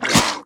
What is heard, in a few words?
Swamp boss Spit